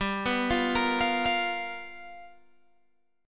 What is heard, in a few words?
G 7th Sharp 9th G chord, Guitar Open G